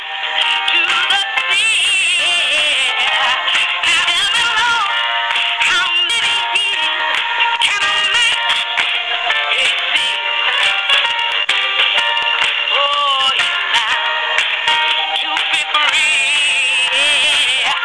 Virgin Mobile hold music

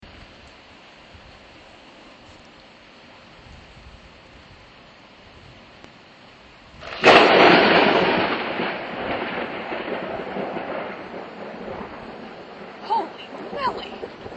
a massive thunderstorm

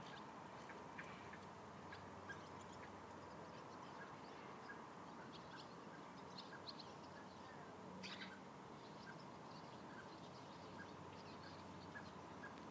noise

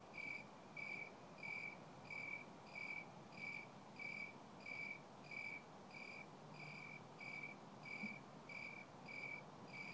last of the crickets

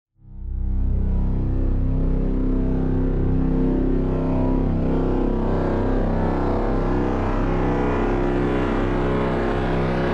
synth test

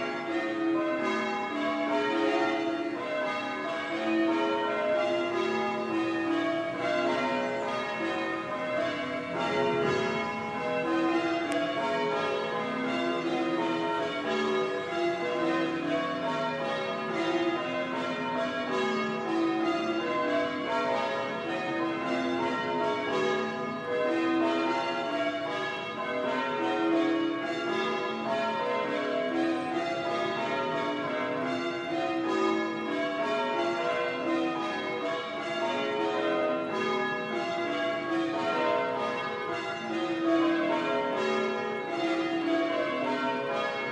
st dunstans church bells

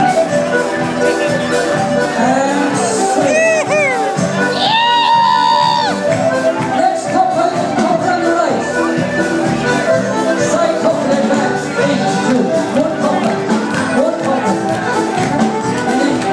aaaarrrgggghhh

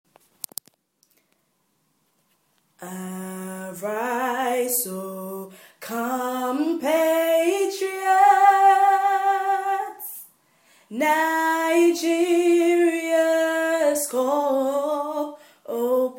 nigerian national anthem

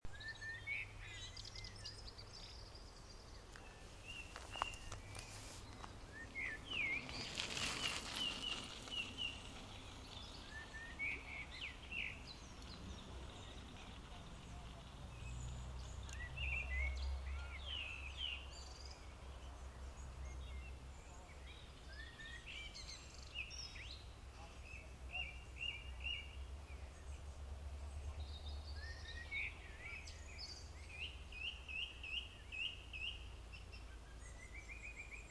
birdsong radio

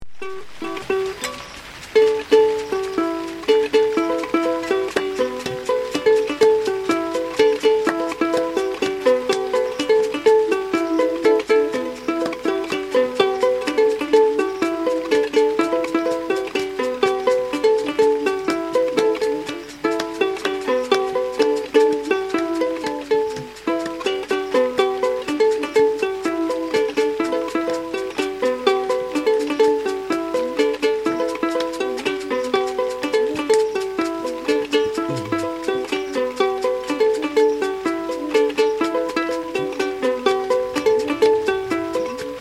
bow harp in the forest with rain dripping; geedal

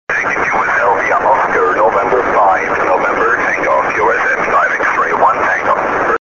6m ssb

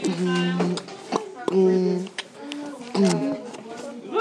beat box

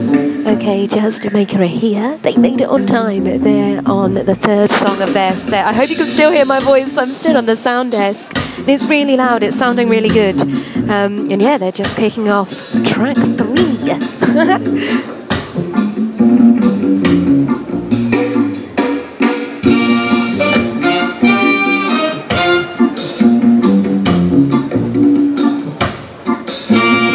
jazz

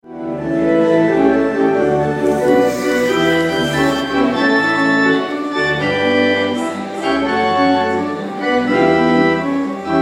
organ recital birdsall church north yorkshire

